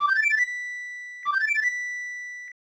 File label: Ocarina Song; Super Nintendo; Legend of Zelda, The - A Link to the Past